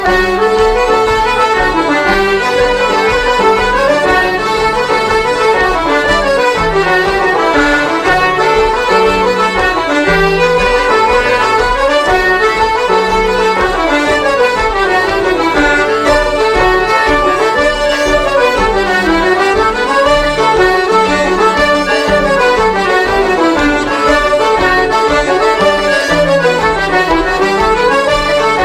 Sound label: Jig; Kilfenora